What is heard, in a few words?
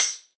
Tamb